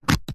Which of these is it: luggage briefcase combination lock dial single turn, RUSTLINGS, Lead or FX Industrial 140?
luggage briefcase combination lock dial single turn